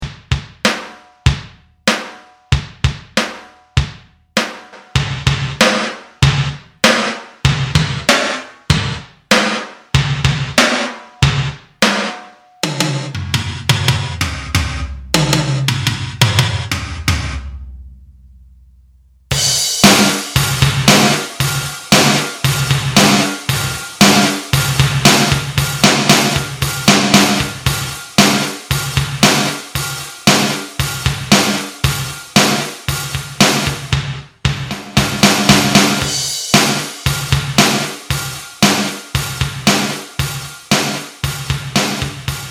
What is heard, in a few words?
Roland SRV-2000 Drums Gated